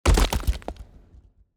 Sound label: heart damage stone